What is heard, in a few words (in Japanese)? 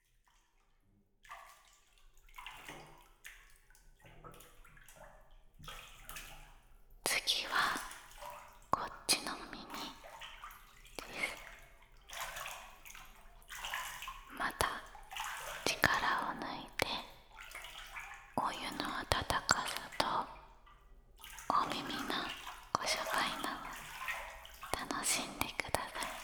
寝湯で耳かき（左耳）; ASMR